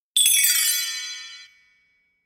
何か思い付いた時の音

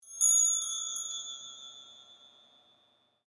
ウインドチャイム 単音 低音

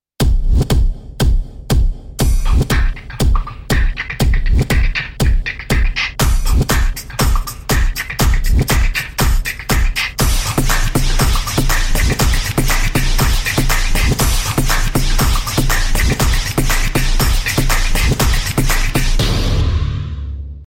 オープニング BPM120

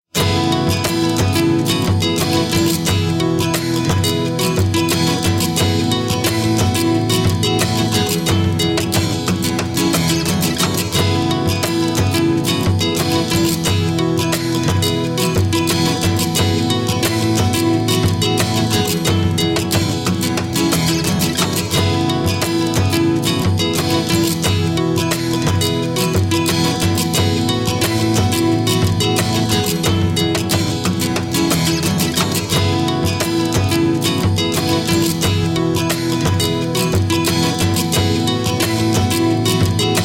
BGMさわやかなアコギサウンド BPM89

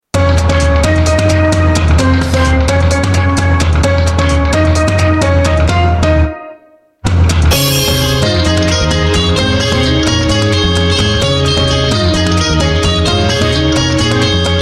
BGM メロディ BPM130

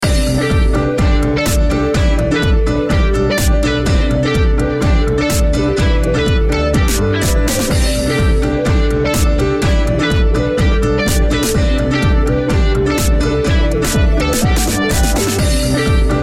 ジングル 旅立ち BPM125

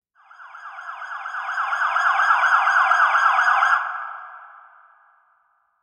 サイレン （早）

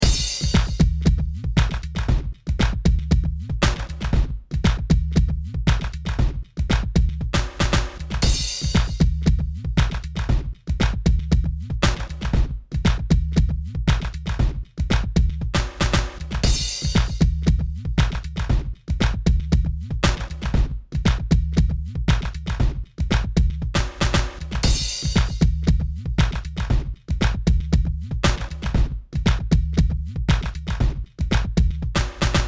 ドラム ループBGM BPM 117

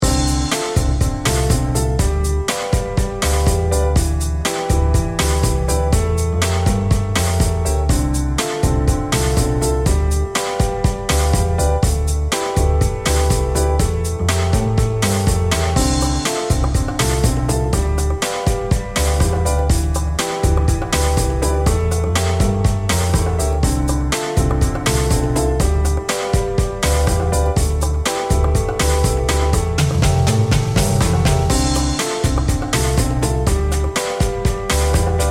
ジングル 淡い夢 BPM122

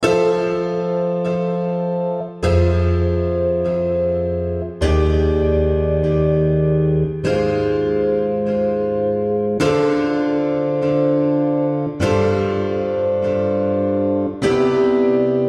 重みのある精悍ピアノサウンド BPM100

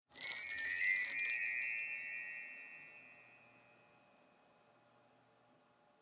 ウインドチャイム ショート 中音～高音

